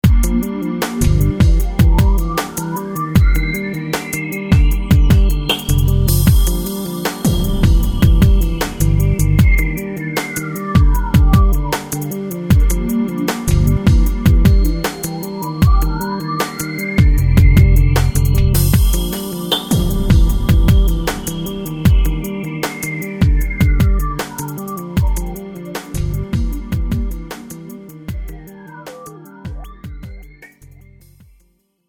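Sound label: Down Tempo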